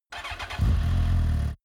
motorcycle sport bike start engine

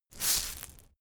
insect shaker hit single soft

